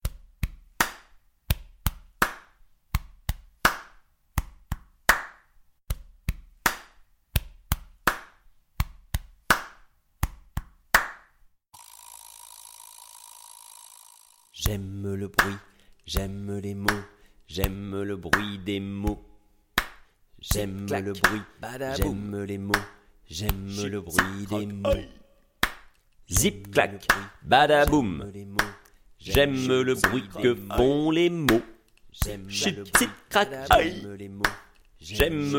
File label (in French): Zip clac 3 voix; mots en musique